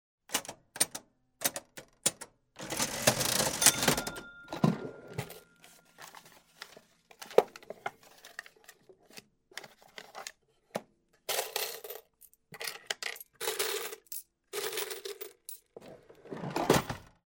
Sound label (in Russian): nazhimajut na knopki vybivajut summu otkryvaetsja kassa berut kupjury i monety kassa zakry